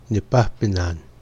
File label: we laugh